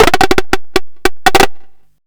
NUCLEAR, Hard & Loud Techno

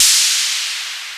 NOISE, ELEC.DRUMS; Crypton - DrumBase5000

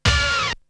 Hit; ZERO G - Datafile DISC 2

